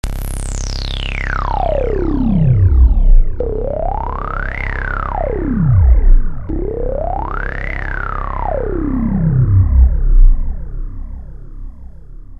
filter

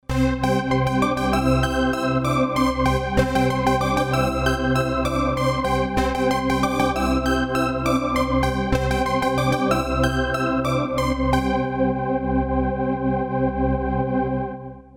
DancePad SYNTH

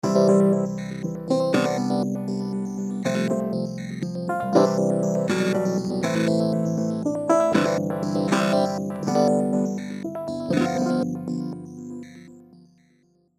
Emotional Piano Dist Lively Synths; Pigments; COLDFIRE

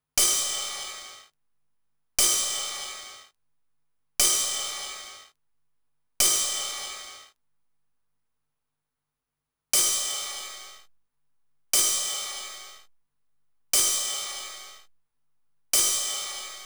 RD 9 vs TR 909 RIDE

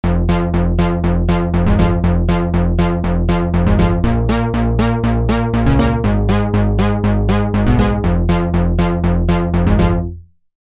PORTA SEQ; DSS

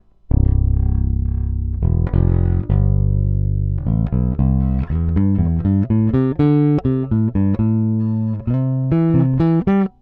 Neck Pickup Tone open

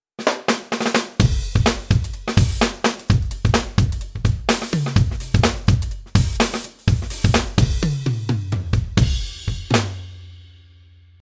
Drum n Bass

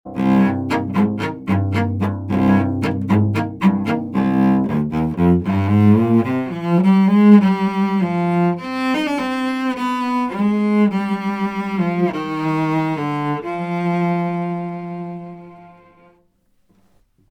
Sanken CU-44x Mk2 Cello

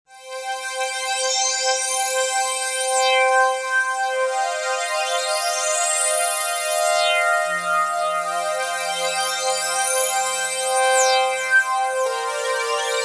Sequence B

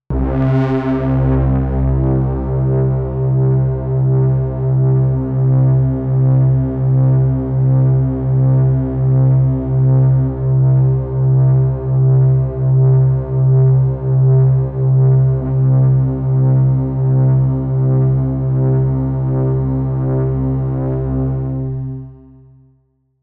Moog Crush Drone

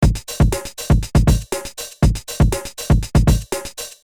jungle

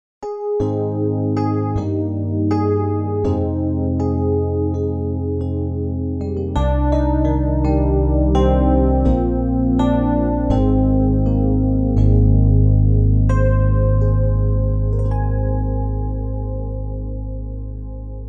Nord Stage 3 Layer

